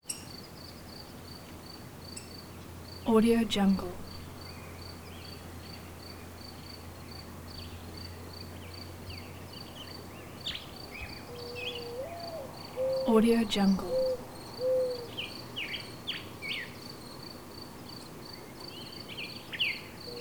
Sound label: desert dusk crickets chirping birds tweeting; prosoundfx